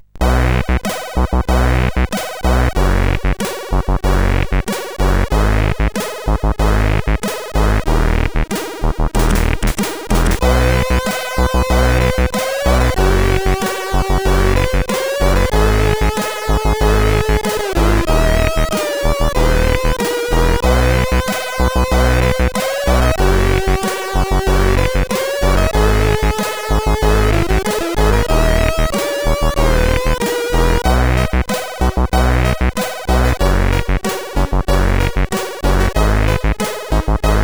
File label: Game Song, sid CSG8580R5